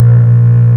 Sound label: CELLO, MELLOTRON; East West - Global Sounds